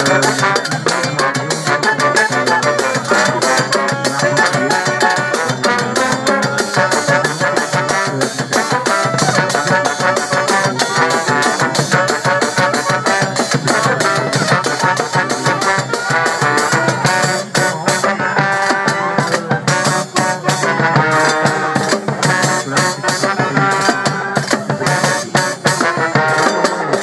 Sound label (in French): aux rythmes endiablés; sur le bassin paul vatine